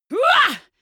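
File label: female combat attack short, screams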